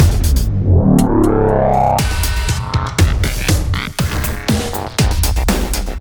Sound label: Taunt; Evos; Atlas Reactor